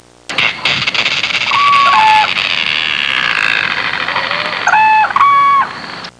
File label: Everglades bird sound